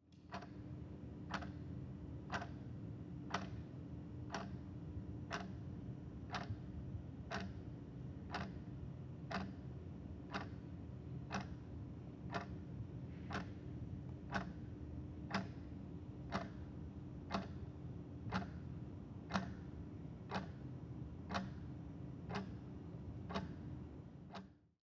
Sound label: cafeterias clock